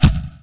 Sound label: Bal Shot